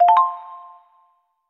Calendar Event, notifications

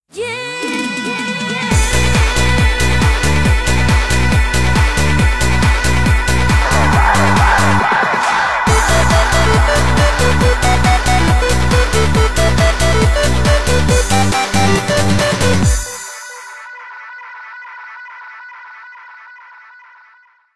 Nokia 6500 slide Ringtones; Glint

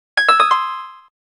harp, keytone; SKY IM-A600S-Sirius

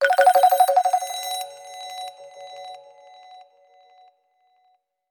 ringtones; HTC One M7; Khaki

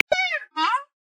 North White Rhinoceros; Xiaomi Redmi-Note-8-Pro; Africa

